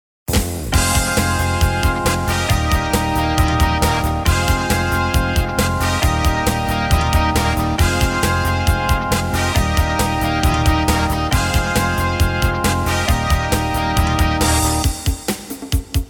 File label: Rock Oldies Medley; bonmidi music